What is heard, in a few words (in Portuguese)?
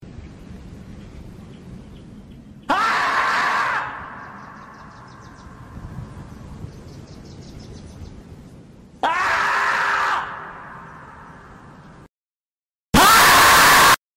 castor gritando